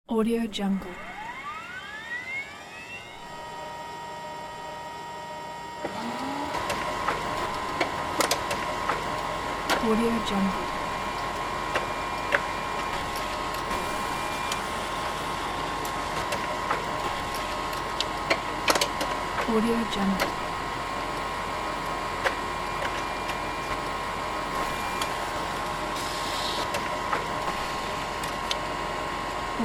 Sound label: photocopier sounds